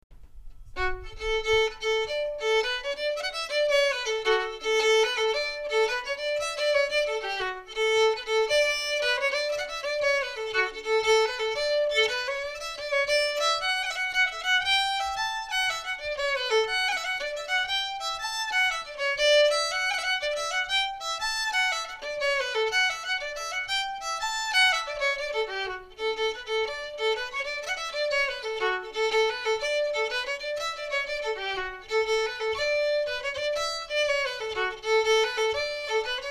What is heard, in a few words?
fast